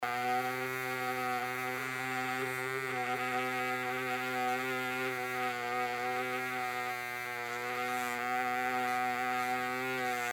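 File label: vibration sound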